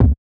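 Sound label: clear kick sound c sharp key, bass drum; kick drum samples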